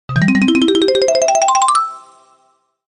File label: Collect Treble Cleff; Banjo-Tooie